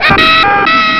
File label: jumpscare sound; return to duck world